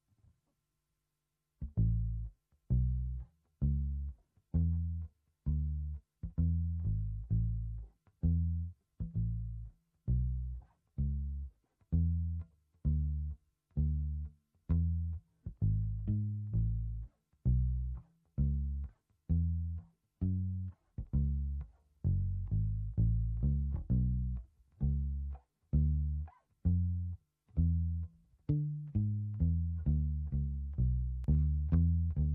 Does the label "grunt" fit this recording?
No